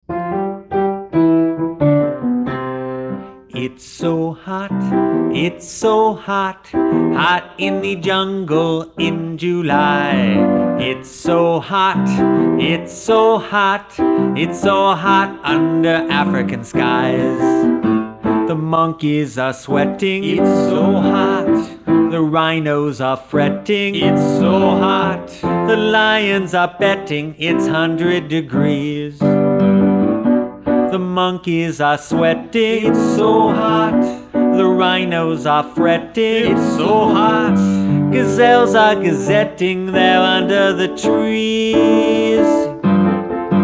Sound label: Vocals